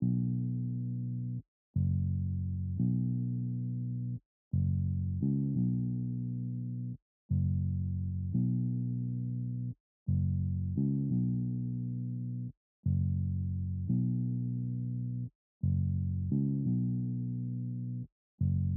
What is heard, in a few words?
173 BPM F# Min Bass; Melody; Cymatics; 2022 Melody Collection (BETA); Pied Piper